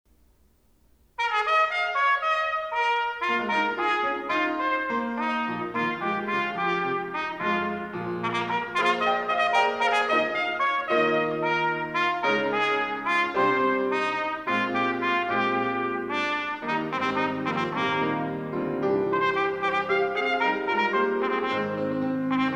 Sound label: Sonatina Piano; Petrich